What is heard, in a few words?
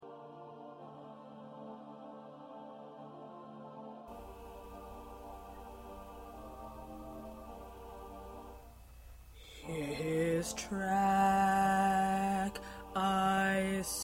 Lead